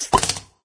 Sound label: Pop; candy crush saga gamerip